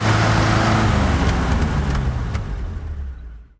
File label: engines; World of Tanks